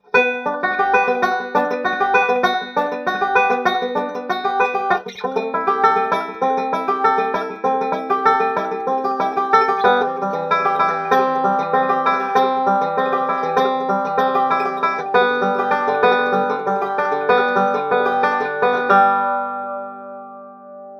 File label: banjo through guitar invfz